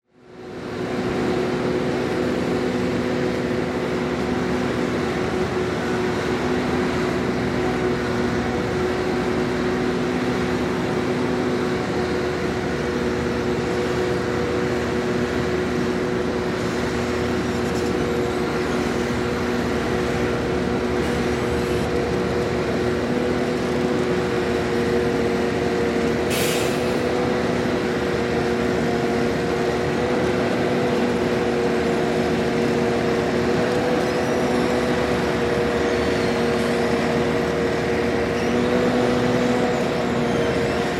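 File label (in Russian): звук ремонта дороги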